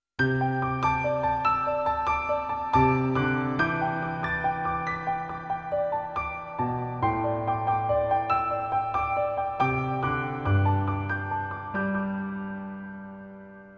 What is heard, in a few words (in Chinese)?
纯音乐 - 抒情优美的音乐